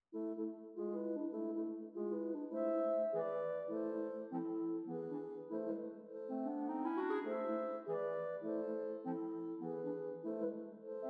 Jazzy Christmas

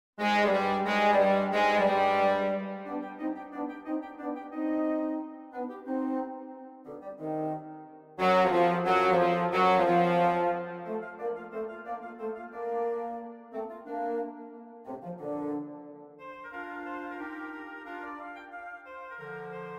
Wind Octet; Bruschino

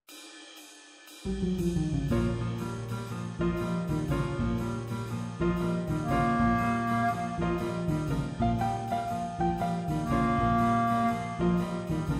Beg Blues

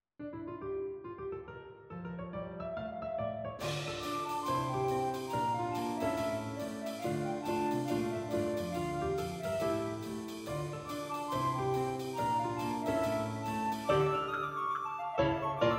flute

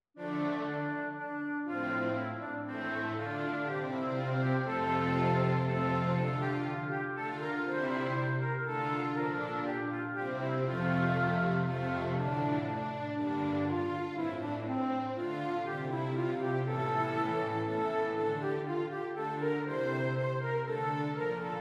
Basse Dance

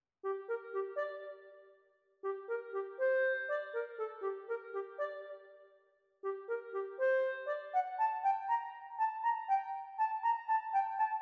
bass

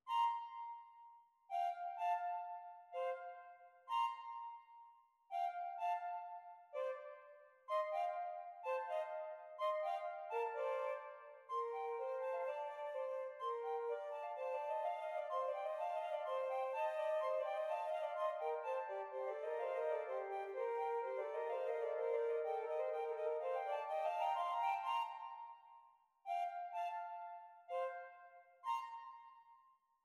Flutes Min

